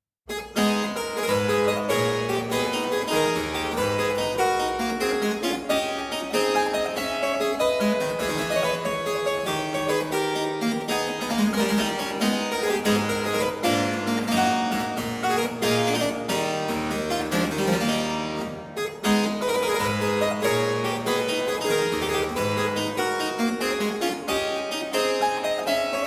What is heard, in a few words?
Gigue, cembalo; Froberger